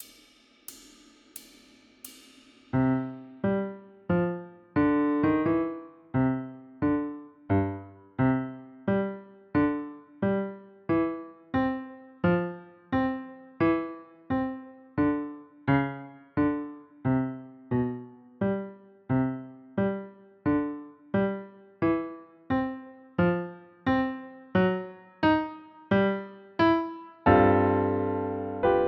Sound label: oboe